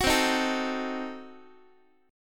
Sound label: C Diminished 7th, Guitar, chord; strummed; JGuitar